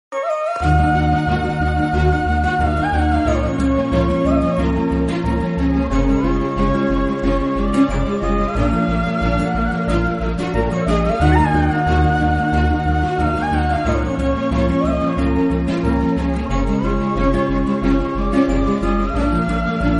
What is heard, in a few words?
kannada movie song bgm ringtone